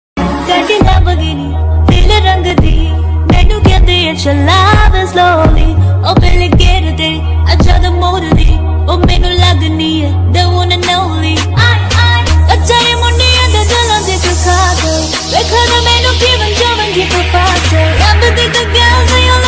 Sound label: ringtone female version